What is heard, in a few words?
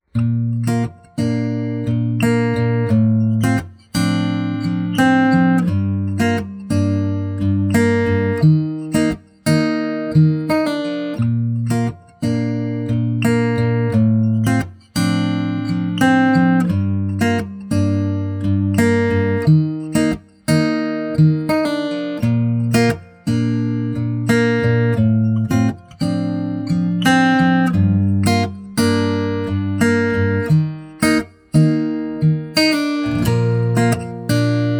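Acoustic Guitar Karaoke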